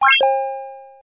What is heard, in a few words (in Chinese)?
放置成功